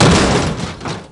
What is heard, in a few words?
wood break, zombie